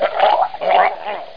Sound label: drown; Hexen II; player